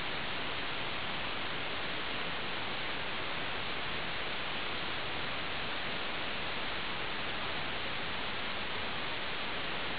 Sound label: white, 白色雑音 20Hz〜20KHz −20dB